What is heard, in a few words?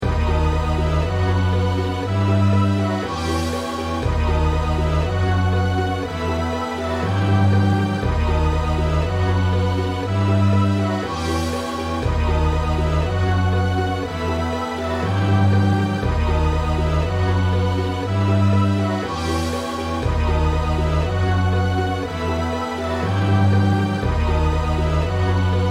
instrumental